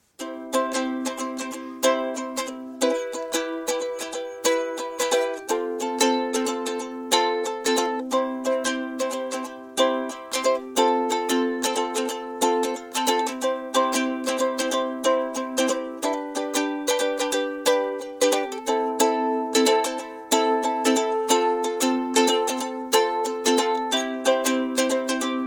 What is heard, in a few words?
ukulele